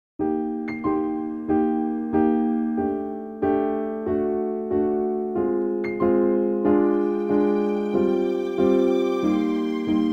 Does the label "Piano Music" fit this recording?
Yes